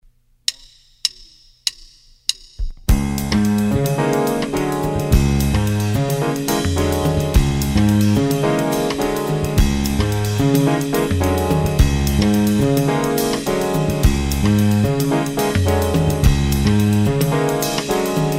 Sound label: with drums